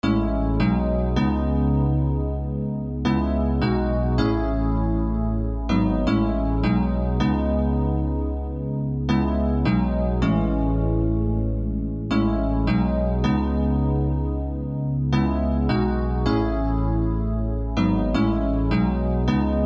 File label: Melody Loop Cmin 159 BPM Piano